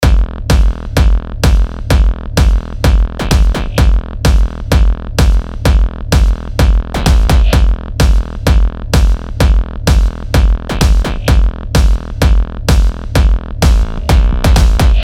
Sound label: 128 Kick Loop